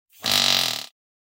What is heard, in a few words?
Fart Noise; SoundsCrate